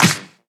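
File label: HIPHOP snare drum; s950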